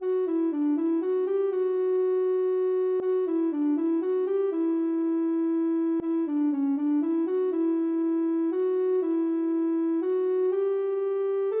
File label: fauno, ocarina